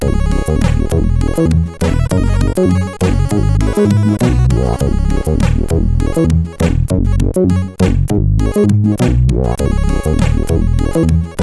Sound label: sid CSG8580R5